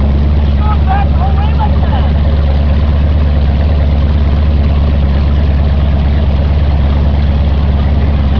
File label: thunder boat; audacity